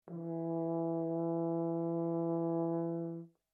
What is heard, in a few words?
HORN E4 P LONG, French Horn in F; Alexander French Horn in F; Horns (Sound Effects:Musical)